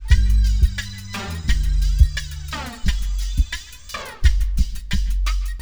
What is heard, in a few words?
BEAT, Hip Hop; E-MU Formula 4000 Series Vol. 1; Hip Hop Nation; Beats 59-88bpm